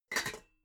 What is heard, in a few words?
pots clank quiet